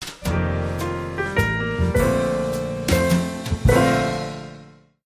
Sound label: Twinkle Twinkle Little Star